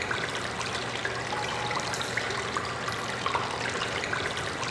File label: water cave; ambience stereo